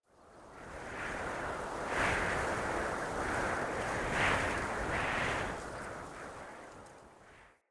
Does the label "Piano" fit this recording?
No